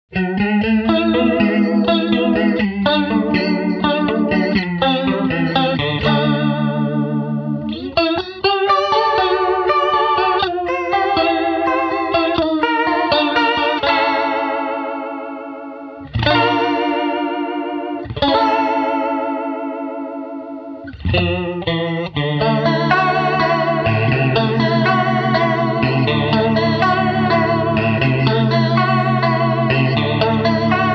vibro leslie

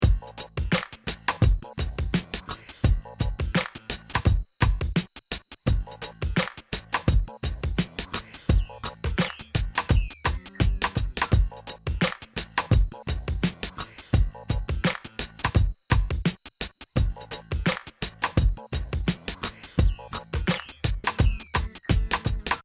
Multi Comp

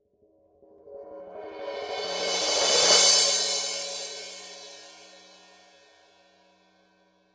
heavy crash roll

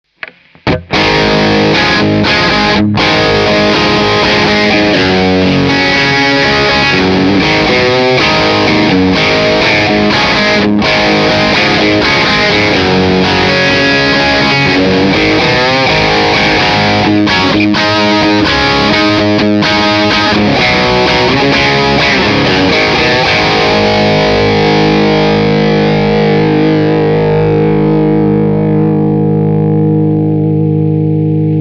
Plexi Tone Rock; Carl Martin

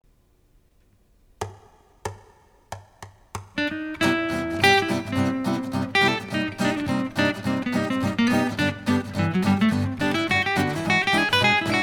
django

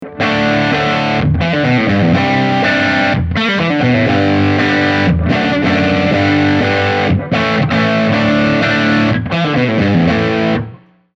riff; JTM

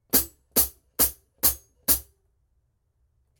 chick

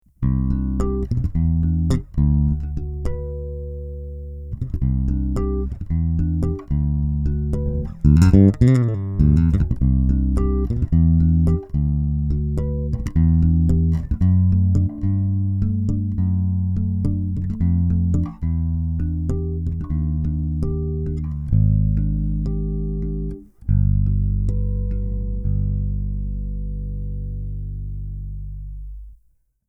Passive Jazz Finger Tweeter Tone Modern; BG500

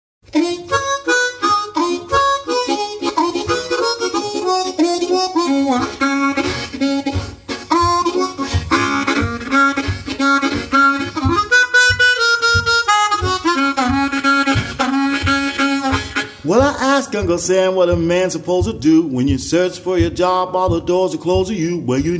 Hohner Golden Melody

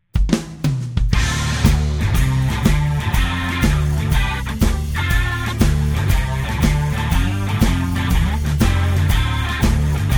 med blues

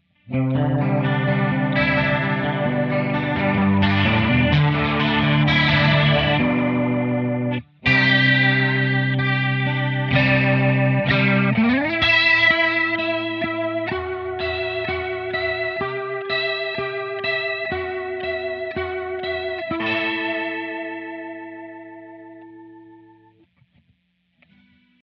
rotary

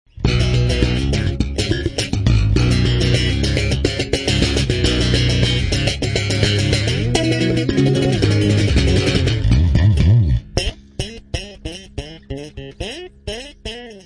Chorus

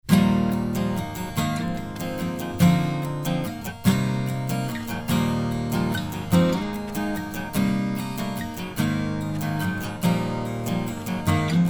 KIWI Acoustic

